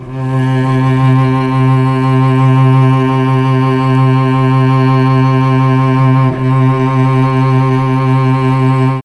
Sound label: C2 CELLO, mellotron; CHMBLN CELLO